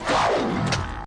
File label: space zap door